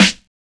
Snares

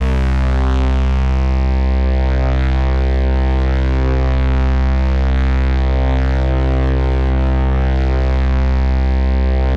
Bass

